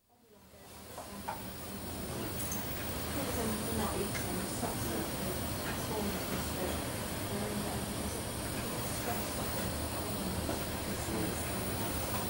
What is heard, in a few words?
Intensive Care Unit atmosphere; Hospital Sound Effects; BBC